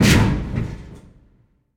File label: Pilon Low Impact